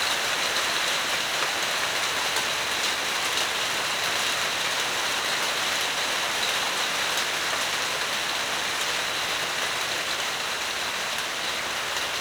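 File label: rain medium; WAR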